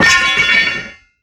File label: drop weapon